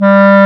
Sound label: WND CLA-A, Clarinet; Roland L-CDX-03 Disk 1; Clarinets